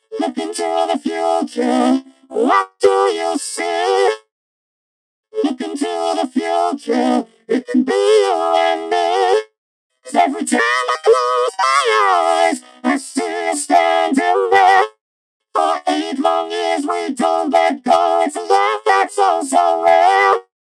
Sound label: Autotune Classic Vocoder; Antares